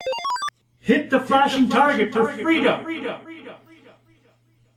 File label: hit freedom target, Pinball Sound Effects